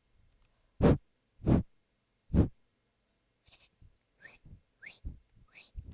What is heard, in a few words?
Jump Flip